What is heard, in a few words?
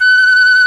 SHIAO F4#